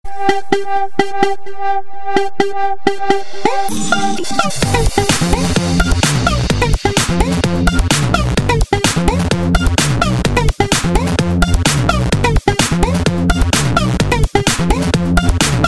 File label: Clubmix